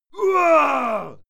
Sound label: male fight moan heavy; schreie; menschen; soundpool